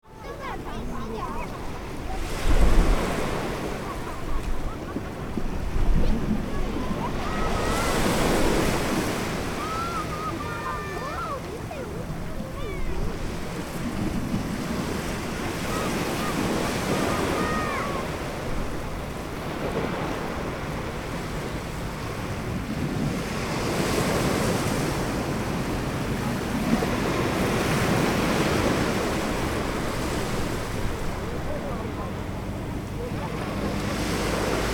Beach cove ambience kids in playground with family